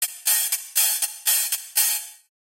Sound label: hihat